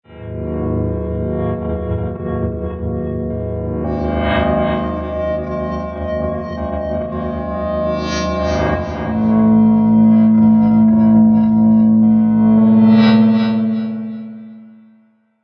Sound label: alarm; Expedition Laboratory Original Game Soundtrack